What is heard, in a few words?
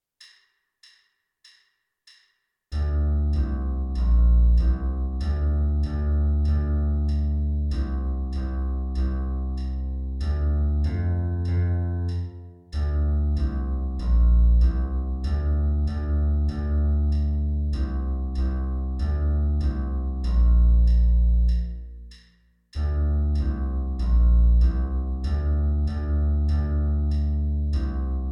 electric bass